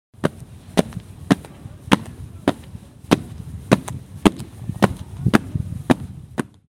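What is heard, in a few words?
stomping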